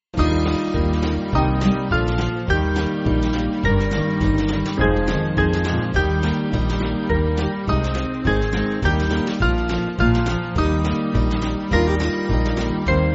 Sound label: Band, Backing Files for Churches